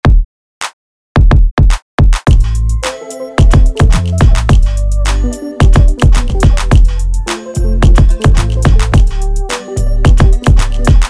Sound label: Thump Kicks